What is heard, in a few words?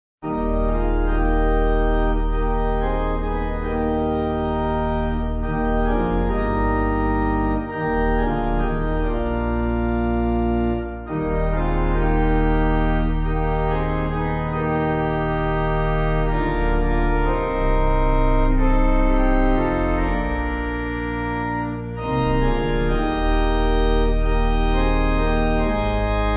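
Pipe Organ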